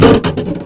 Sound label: Structure Crunch; Damage; The Avali's Audio Overhaul